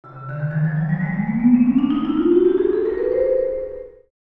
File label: Old vintage cartoon dizzy marimba